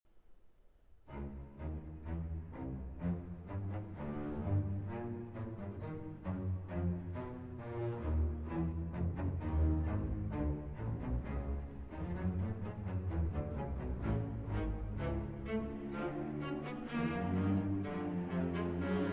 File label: Symphony, Larghetto; Symphony No. 1 Berliner Sinfonie; Weill